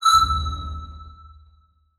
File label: Beep Long